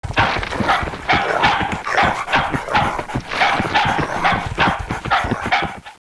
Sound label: cavalry; MIDGARD